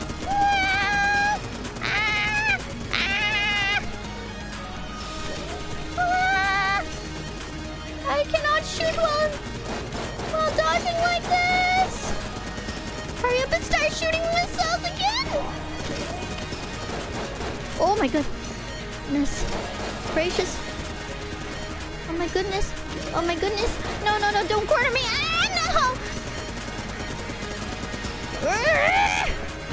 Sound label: struggling; IRyScream